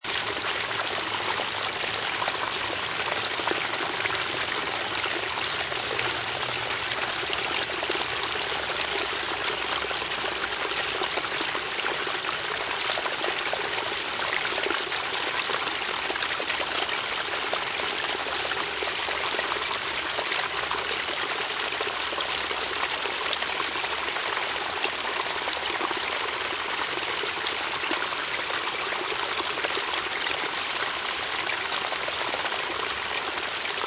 Small Forest Stream, Small stream, river or brook running gently; Small stream, river or brook running gently through the forest. Perspective about 5 ft from trickling water